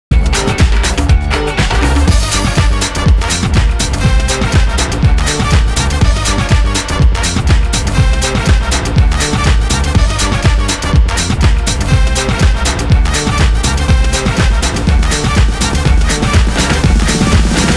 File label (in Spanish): Salsa